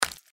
mantis foot; npc

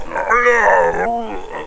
pain, zombies

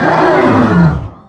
injured

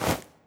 sprint rustle

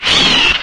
pain; doom3; archvile

